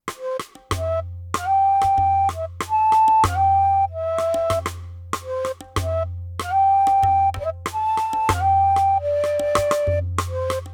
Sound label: Hatchling Song Instrumental